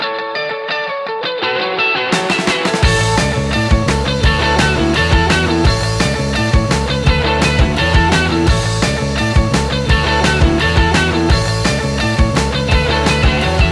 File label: Beach Rock Remix; beach buggy racing 2 island adventure gamerip